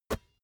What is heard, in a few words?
basketball catch